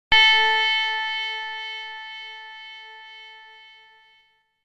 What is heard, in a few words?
Grandfather's Clock